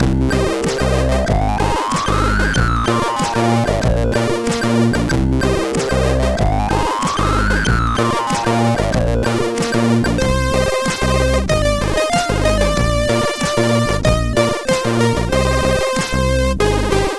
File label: Feeble sid MOS6581R4; hvsc